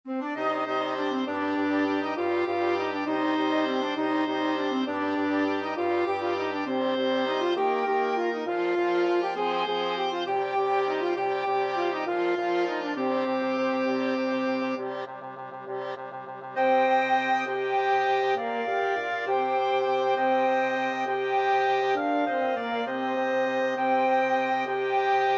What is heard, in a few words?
wind quintet